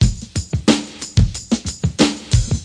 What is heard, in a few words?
091 deep groove big snare; 091 to 100 bpm